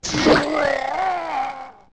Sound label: Hit; Ganondorf; zelda; OOT